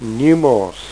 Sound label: numorse, morse; Ham Radio 2000